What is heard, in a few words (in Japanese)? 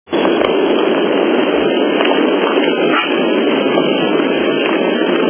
フクロウ